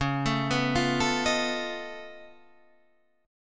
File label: Db Augmented 9th Db down Guitar Half Step Down